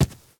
grass run, step

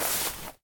moss step

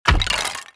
death, skeleton

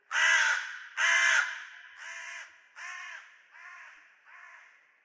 crow; minecraft; Remastered; ambient

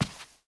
rug walk, step; blocks

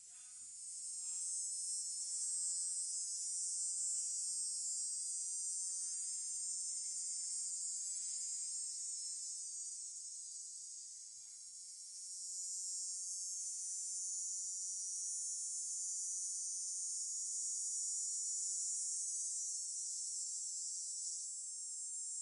cicadas desert; ambient

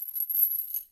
chain, step; blocks

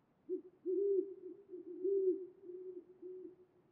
owl

